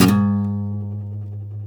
A, Martin, guitars; Spectrasonics; Hans Zimmer Guitars VOL-1; MARTIN HAMMR

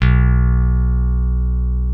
B1 F.BASS; Keith Emerson Signature Series

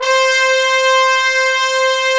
BRS TBNS F, Bone Sec.; Roland L-CDX-03 Disk 2; Bone Sec.FX

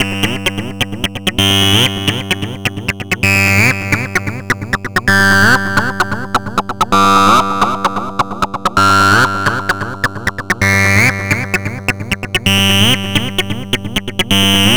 NRG Lead, trance

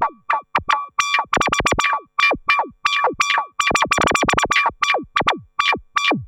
Odd Modular; radiosonics

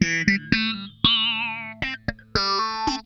FAZE PLUK, Funk Guitar; 90 s Sample CDs; Zero G

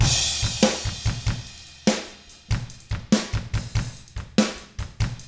rock 6/8, drums; ILIO; Double Platinum Drums 1

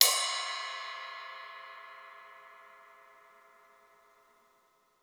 CLUB RIDE; 3D Sound; 3D Drums

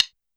Sticks, YAMAHA RM1X

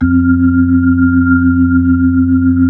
B-3 Chorus

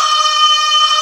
BULGR G#5, BULGAR VOX; East West; Global Sounds

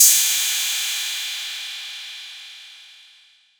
PERC 808; Wizoo - Platinum 24 Electronic; CHR PERC 808

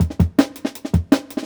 Jungle Breaks

